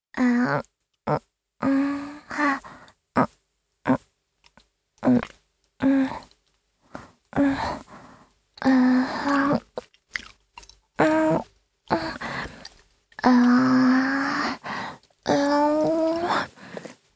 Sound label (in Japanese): 上半身を舐める(弱):胸部を舐める; 実用ボイス抜粋集